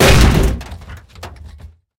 tank impact generic

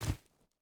footstep burlap gravel jump land; Rust

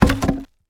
objects, World of Tanks

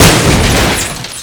explosion metal debris rattle; Explosions

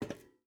footstep rubber boots metal walk; Rust

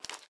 ui pickup meat; Rust

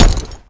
foley impact

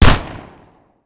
hind gatling cooldown; veh hind gatling cooldown

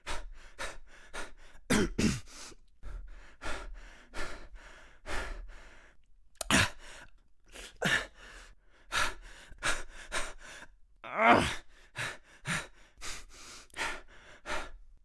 breath hurt, voice; Escape from Tarkov; usec2

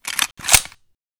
Inf Weapons Foley; Red Orchestra Ostfront 41-45

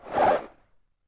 Arrow Fly; Civ4 warlords

